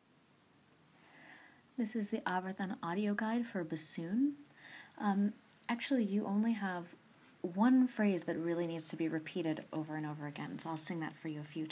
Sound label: bassoon